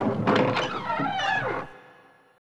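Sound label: creaky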